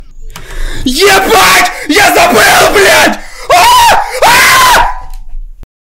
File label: quantum processing